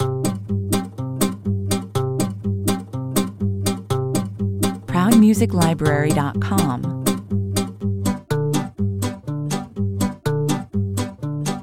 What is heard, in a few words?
funny walk (no vocals) 123 bpm